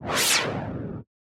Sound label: Anime Air Movement